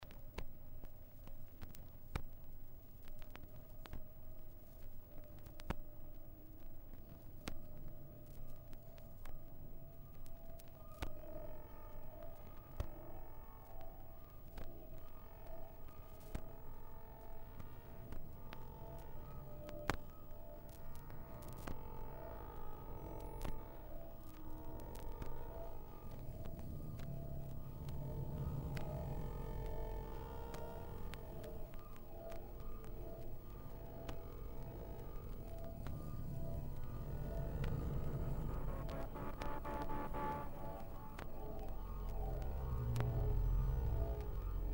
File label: dub; Jarring Effects